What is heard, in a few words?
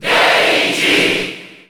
Cheer Japanese; Daisy; SSBU